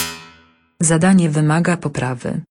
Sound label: fail